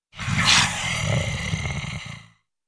Large, Hissy, Throaty Growl ( I.e. Tiger, Lion, Monster )